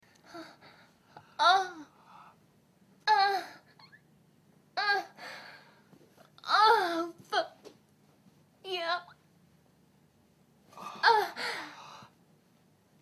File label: moan